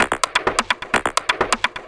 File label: VFX2 Loop 128 BPM